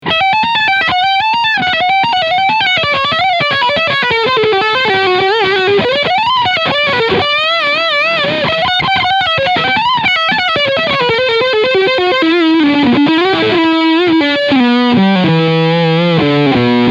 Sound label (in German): Lead Steg PU